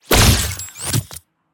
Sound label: Laser With Recovery; quakeball; ZapSplat